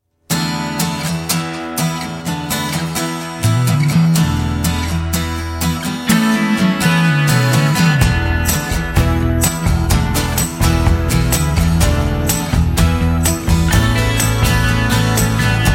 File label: All Things Bright Acoustic Version